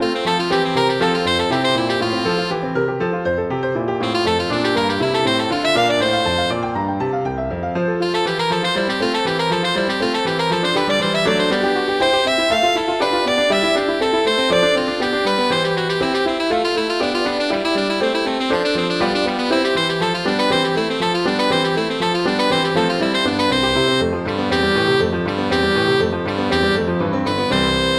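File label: Toccata, Viola Piano; P.D. Paradisi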